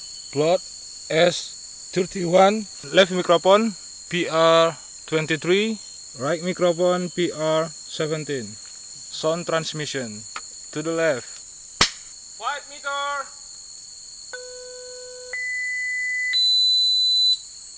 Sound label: birds